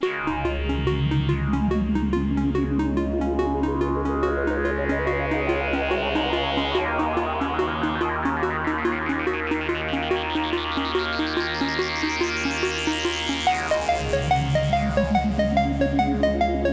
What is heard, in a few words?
ambient